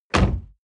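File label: sports car exterior door close hard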